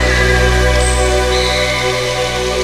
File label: Pad, Jungle; Blu Mar Ten; Jungle Jungle - 1989 to 1999 Samplepack